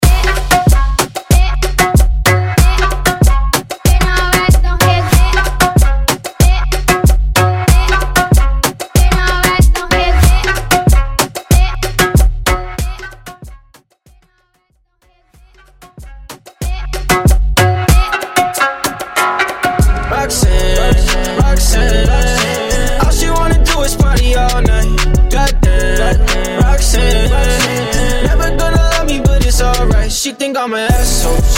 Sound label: Moombahton Mainstream 100 Bpm